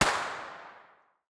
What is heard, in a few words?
22 cal pocket pistol gun shot